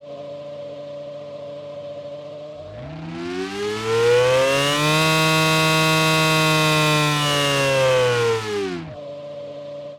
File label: v8 formula, engine sound